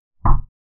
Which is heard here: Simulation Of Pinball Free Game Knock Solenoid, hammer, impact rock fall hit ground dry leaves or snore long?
Simulation Of Pinball Free Game Knock Solenoid